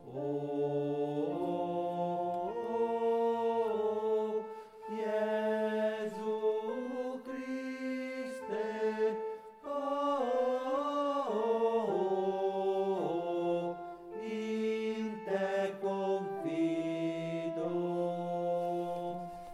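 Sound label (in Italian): In Te Confido, tenori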